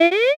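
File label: voice eh, female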